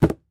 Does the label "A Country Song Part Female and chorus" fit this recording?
No